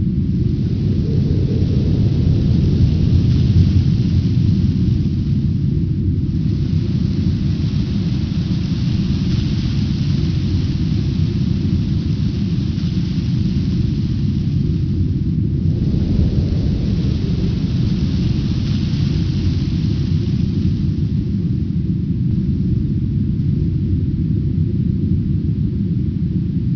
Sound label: windy day